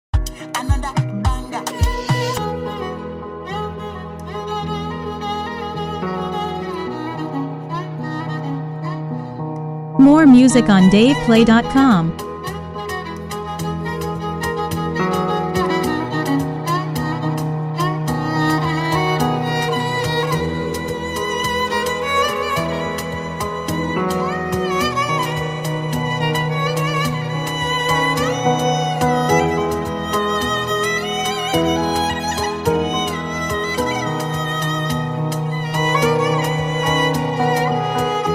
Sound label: Violin Cover